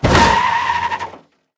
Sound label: drift; War Thunder